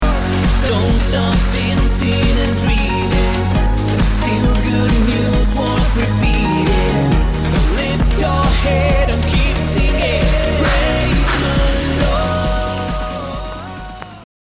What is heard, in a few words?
BPM135